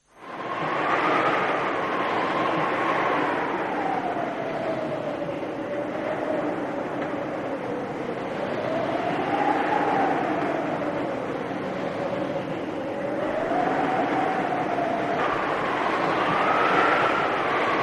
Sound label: wind, ambience; umi